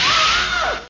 girl die; super trench attack 2

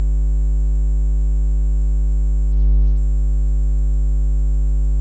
electro

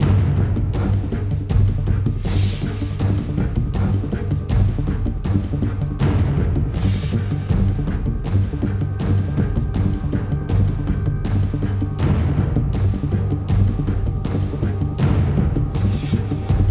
Loop Tense; the walking dead michonne

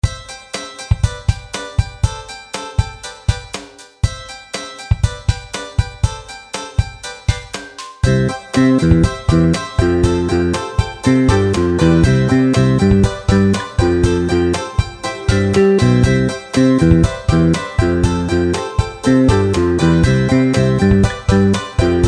BGM Opening

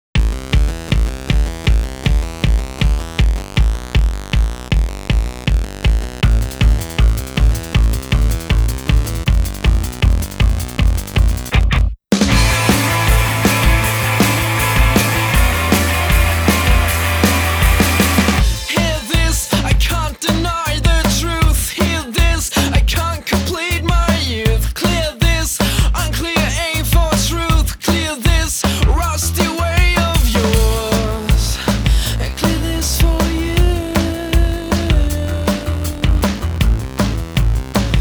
A Blast Beat; Guitar Freaks V5; DrumMania V5; Rock to Infinity

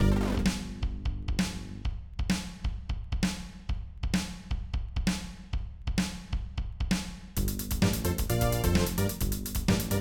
Funk, Arachno; Gene Funk; Blake Stone Aliens of Gold; MS-DOS

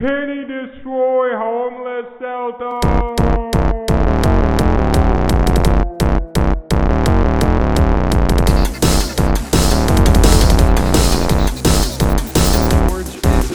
Keys

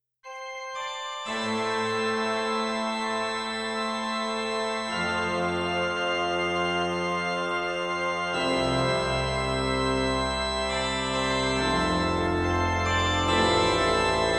Organ Works; Operation Flashpoint

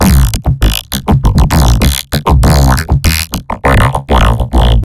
Beat; Mass Effect 2; Legion

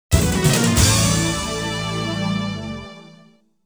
Game Over; tilde game music collection vol 3; tilde asuka120

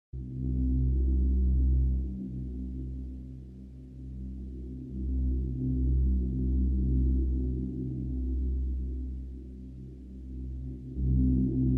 Ambience; Five Nights at Wario's 3

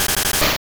Rock Slide Loop; attack moves; pokemon; gen 2; gsc

